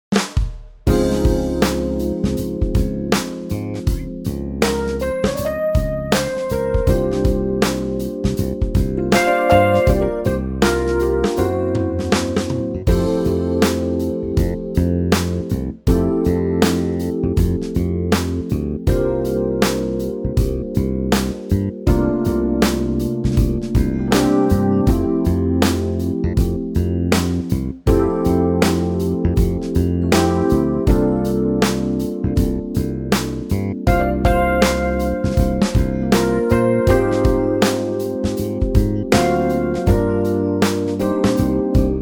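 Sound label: F groove, male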